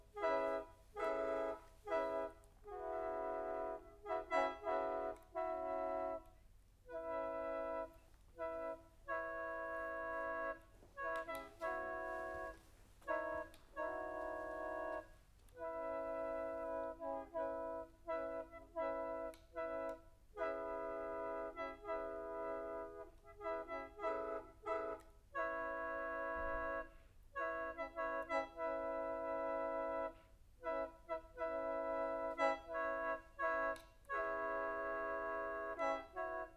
orgue bambou

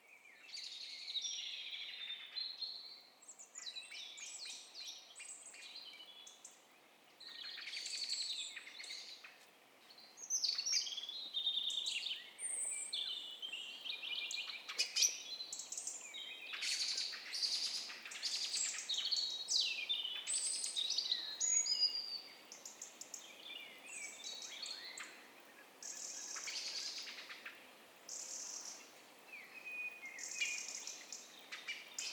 oiseaux nuit

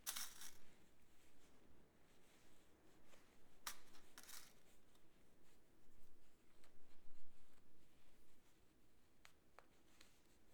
epingles

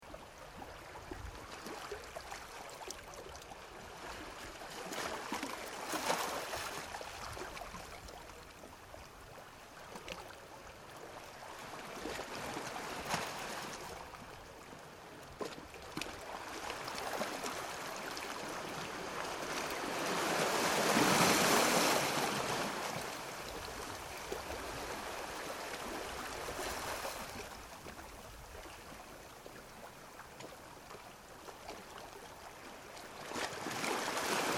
mer sanary proche

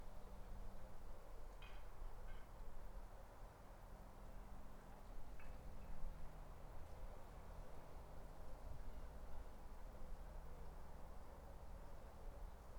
cloches dimanche

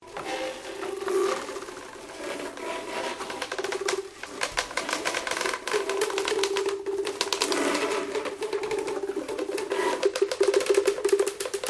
tubes grattes sur carton

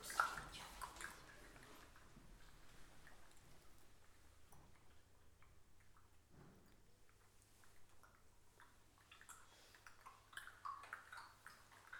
clic clop bouche

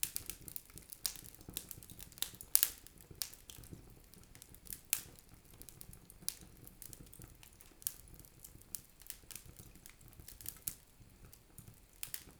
feu; mono2

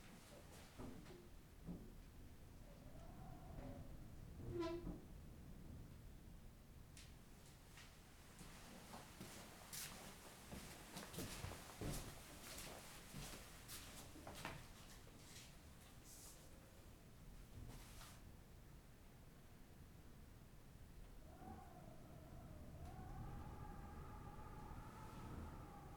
vent salon; st -vincent

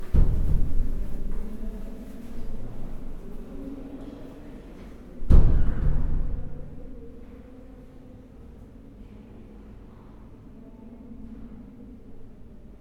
descente esc; Avec vues sur terrasses

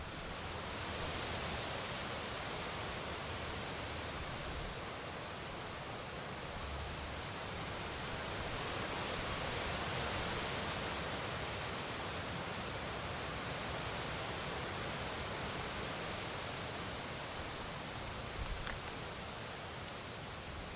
vent cigales

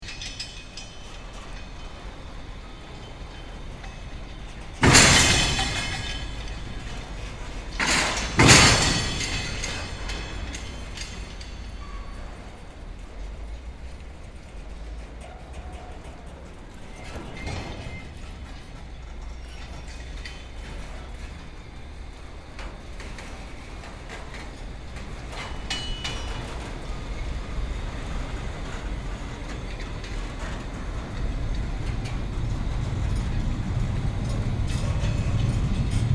train long

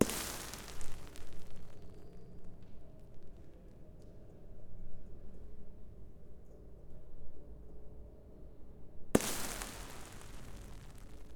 boucles gouttes; L'iliade Philippe Brunet

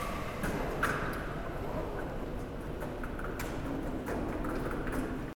rythme lessiveuse; Avec vues sur terrasses

